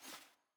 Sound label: sfx ui generic section paper hover